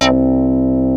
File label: SPLIT; Rubber Chicken Software Sample Bank